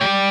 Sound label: bend half